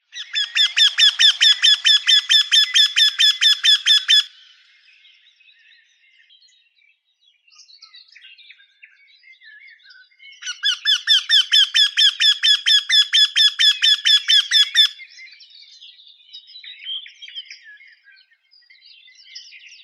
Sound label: vertisheika jynx torquilla